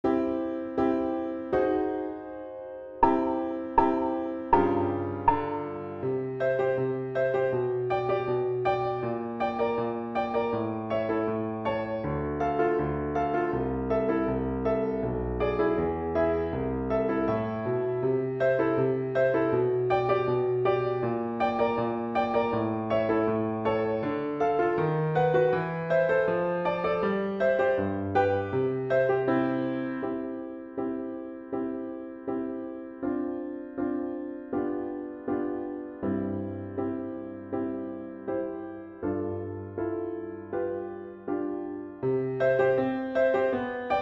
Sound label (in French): polka alto